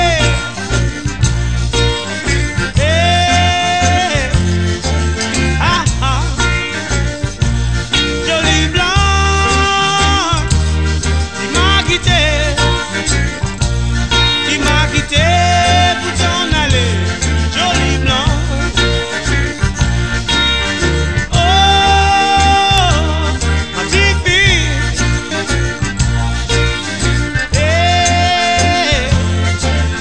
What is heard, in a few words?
blues